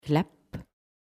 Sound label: Clap; ONOMATOPÉES